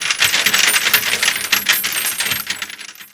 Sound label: hind gatling shells; veh hind gatling shells